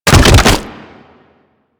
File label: Infested Runner Bodyfall; Warframe; Runners; Foley